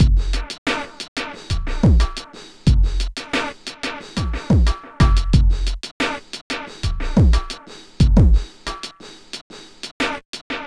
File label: Beat, Drums & Percussion; Electrocution; Beats